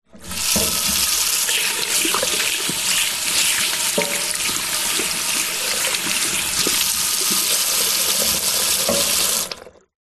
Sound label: public places public toilet hand basic metal wash hands faucet